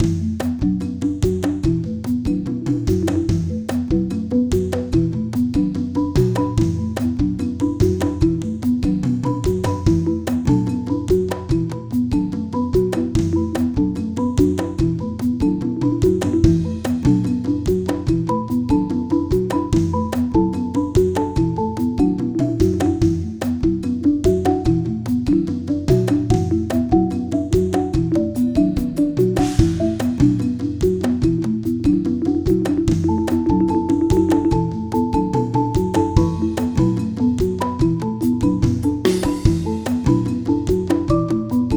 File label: marimba